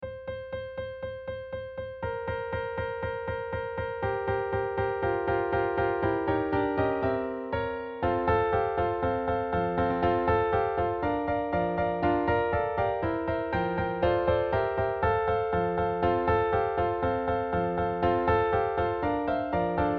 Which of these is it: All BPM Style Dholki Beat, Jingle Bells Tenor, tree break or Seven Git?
Jingle Bells Tenor